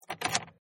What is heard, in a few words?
Car Key Insert, Car Key Ignition Insertion; Cars; Vehicles; Michael Huang